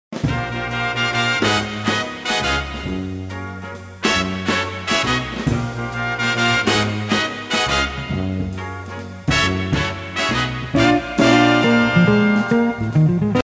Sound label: intro west coast blues